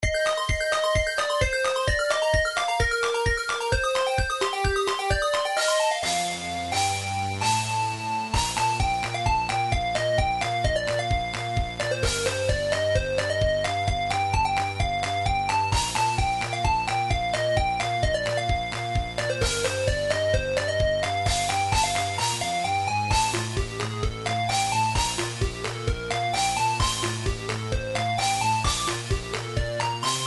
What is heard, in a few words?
techno remix, midi; nintendo bubble bobble main